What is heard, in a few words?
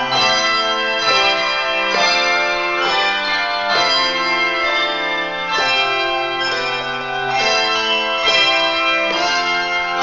crash, ringers